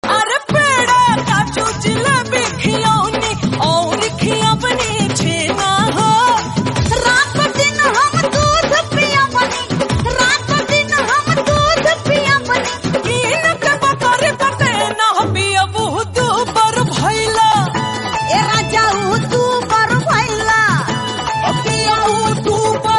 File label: BHOJPURI RINGTONE